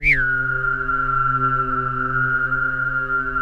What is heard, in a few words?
WHISTLIZR, HUMAN SYN; ILIO; Vocal Planet VOL-3; Jazz & FX